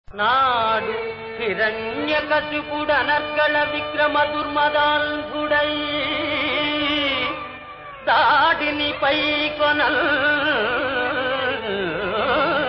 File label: Padyam; ChenchuLakshmi